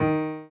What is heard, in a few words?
soft hitwhistle